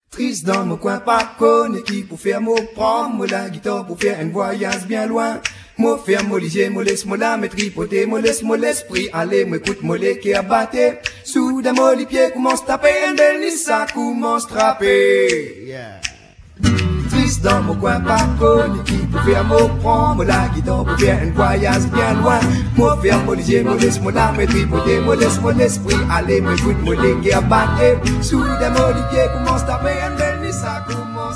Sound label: swing